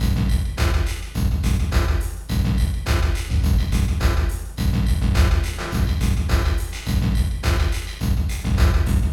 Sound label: Beat, Drums, Eclectic Electronica